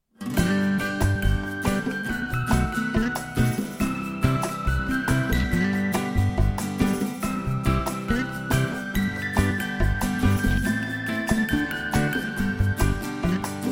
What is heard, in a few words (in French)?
Touches de couleurs instrumental; 1 couplet